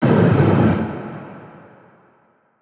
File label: brain Hit; Thalamus; CARRIER